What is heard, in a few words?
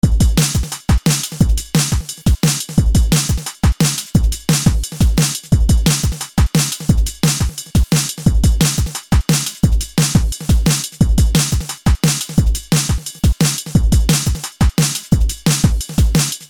drums with percussion